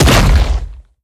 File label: step up right, strider; creatures; seq passby